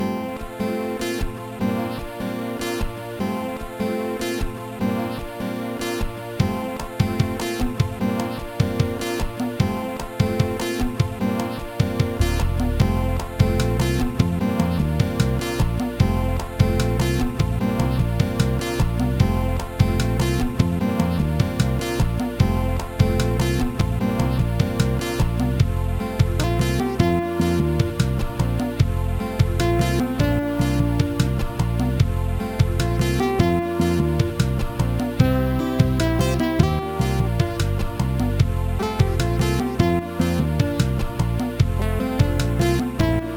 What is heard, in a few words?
acoustic